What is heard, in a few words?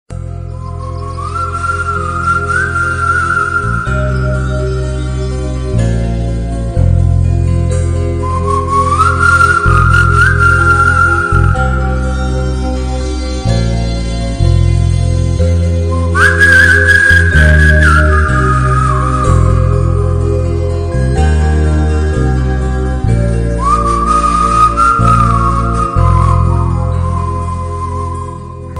bgm song whistle tone ringtone; varanam aayiram